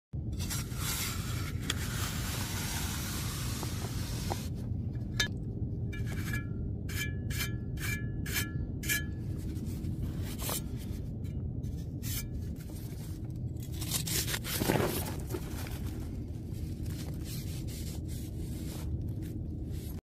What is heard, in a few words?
Masonry trowel; کاردک فلزی